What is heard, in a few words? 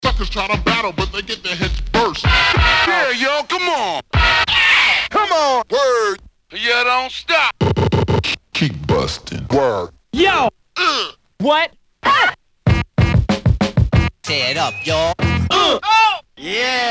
33.3 Rounds Of Scratch Sounds; Belt Drive Breaks